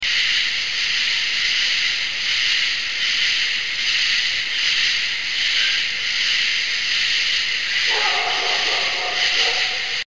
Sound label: cricket